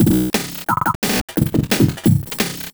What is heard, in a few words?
Glitched Beat 175BPM; glitch kit